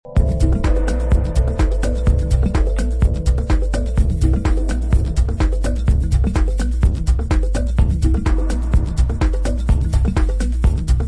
percussion track

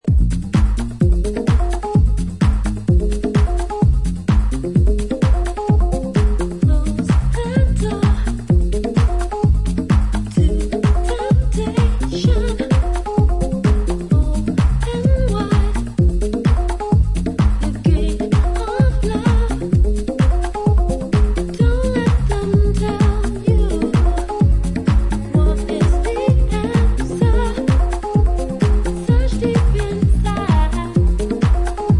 (full strings vocal mix)

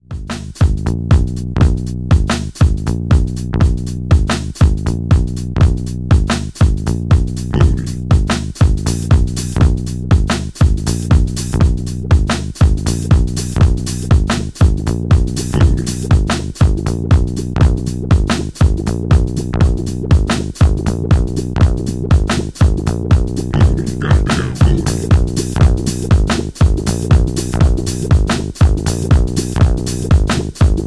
bass mood

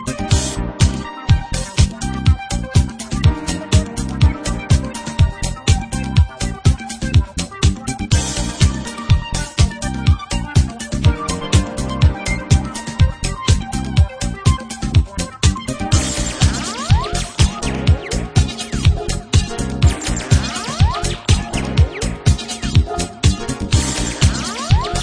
arpeggio life

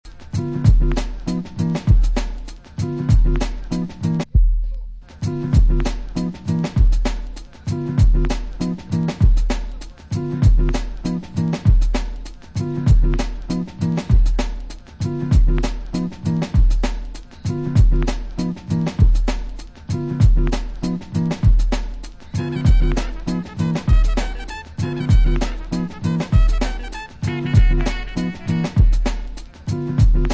Instrumental

